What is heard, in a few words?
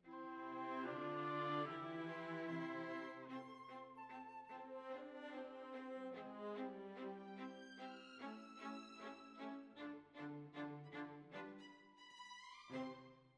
Violas Over